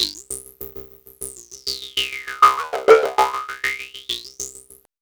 XPANDER; AMG - Vince Clarke Lucky Bastard

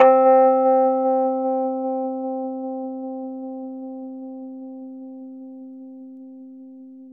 Rhodes 73 Slow, C; E-MU Producer Series Vol. 5 – 3-D Audio Collection; 3DRhodes

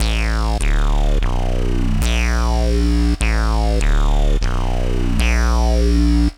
Classic Bass, Drum N Bass; Sonic Foundry (Sony Creative Software); Knutrix Drum & Bass

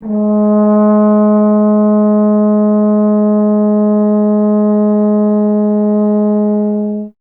BRS TUBA, Solo Instruments; Miroslav Vitous - Solo Instruments VOL-2

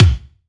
BD, BASS DRUMS; EdgeSounds

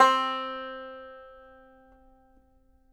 banjo C4, banjo notes; Sampleheads - Whole Lotta Country 1 & 2

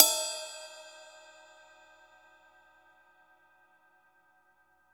Drum Dry; East Collexion; Drum 1

